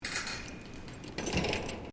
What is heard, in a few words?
GATE CHAIN LATCH HOOK, Farm gate; Gates; Doors